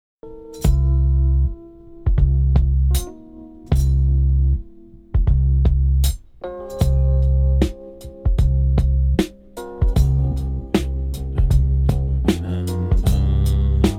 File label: Bass in action